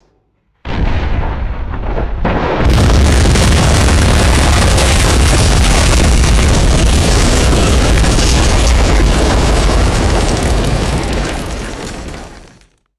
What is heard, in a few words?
loud explosion and massive debris collapse; rocks